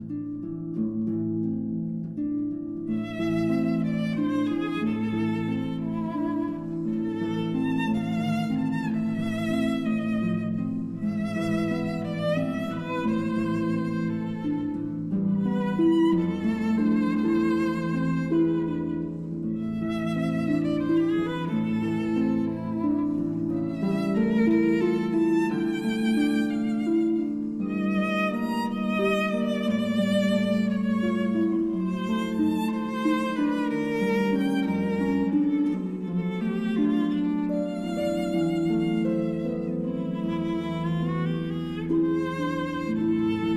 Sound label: Berceuse, for violin and harp